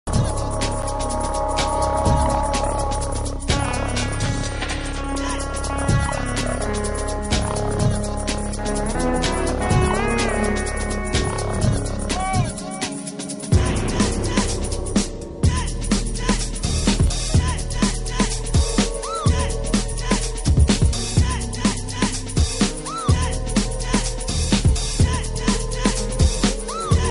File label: drum n bass n steel